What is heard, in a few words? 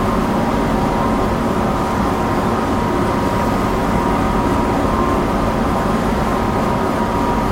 Industrial Near; Duke Nukem Forever